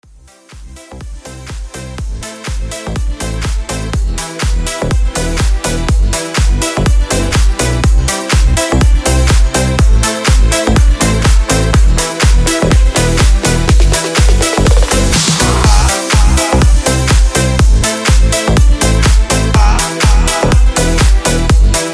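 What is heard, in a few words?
Top40 Bootleg with Redrum 123 Bpm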